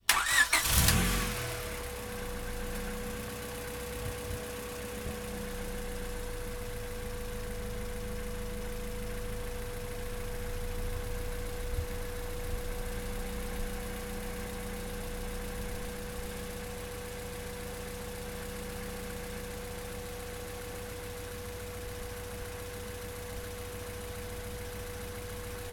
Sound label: Car Start And Idle Outside